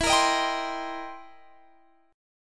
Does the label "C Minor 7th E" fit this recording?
Yes